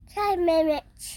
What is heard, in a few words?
Toddler text message